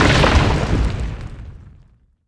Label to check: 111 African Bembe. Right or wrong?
Wrong